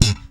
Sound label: POP G#, bass; Spectrasonics - Bass Legends CD 1; ALL THUMBS